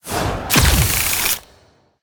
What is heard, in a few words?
start; squares